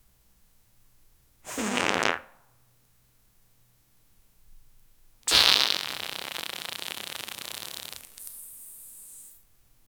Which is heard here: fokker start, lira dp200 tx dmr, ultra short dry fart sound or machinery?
ultra short dry fart sound